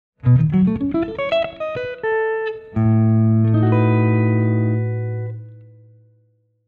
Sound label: B7 lick, guitar; triad substitution